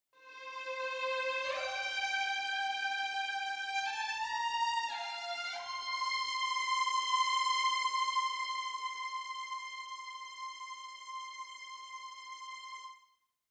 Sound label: Agitato Violins Sus XFade